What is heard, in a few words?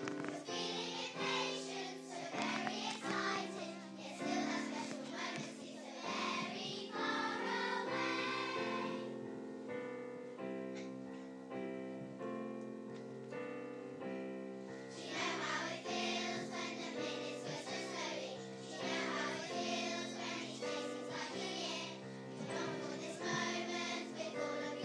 school choir